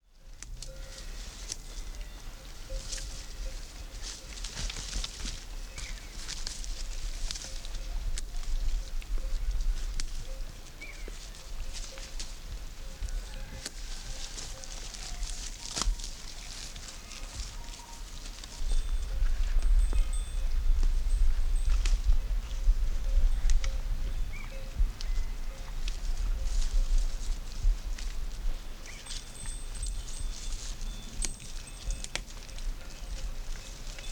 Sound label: Pastoral, Soundscape